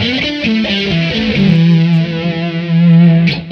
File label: E5 134 rhythm